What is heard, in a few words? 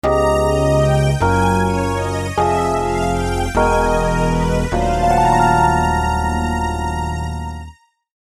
Chords